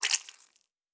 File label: Blood & Gore; Survival Game Sounds - Lite Edition; Combat and Hunting